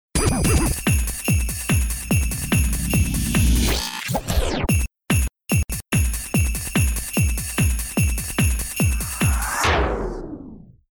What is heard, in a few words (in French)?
Base rythmé